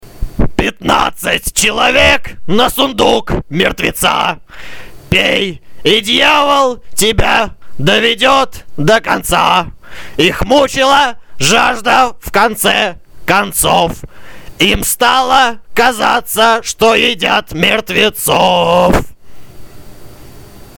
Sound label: parrot